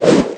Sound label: swing